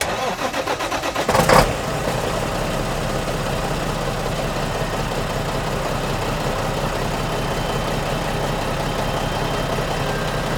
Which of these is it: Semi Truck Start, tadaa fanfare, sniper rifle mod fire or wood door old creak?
Semi Truck Start